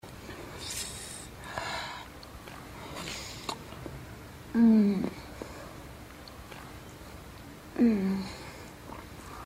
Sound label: suck; Low Blow